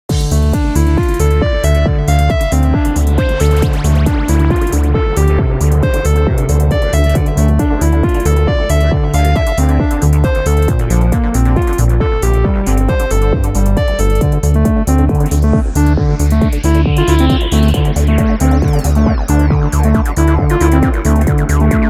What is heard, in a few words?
Pinball, Music; Pure Pinball